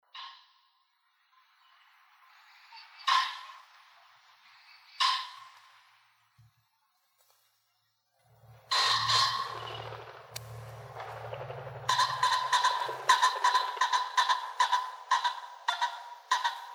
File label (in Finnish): fasaani